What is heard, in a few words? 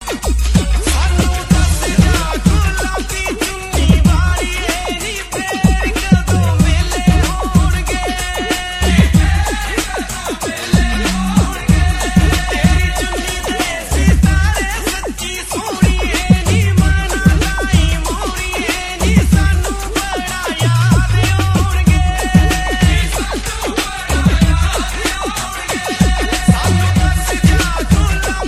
punjabi ringtone